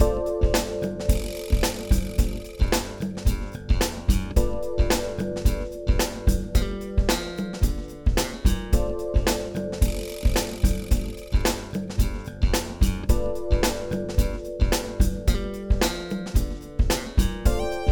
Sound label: 70s cop groove